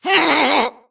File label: grunt electro; Titanfall 2